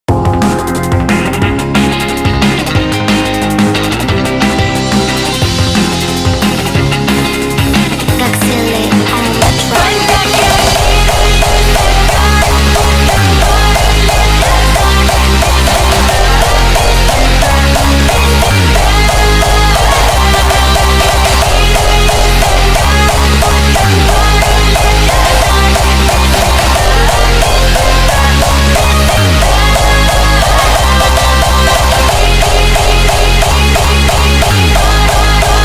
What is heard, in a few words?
hardstyle remix